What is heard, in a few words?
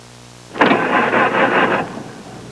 CRANKING, Auto; trasporti